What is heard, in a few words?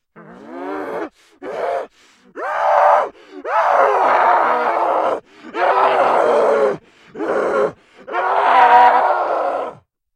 Scream